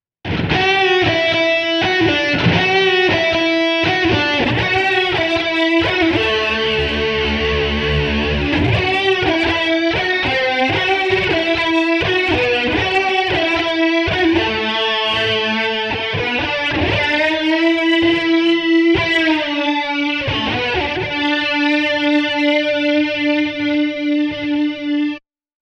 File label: E Guitar Chorus; Boss CE-1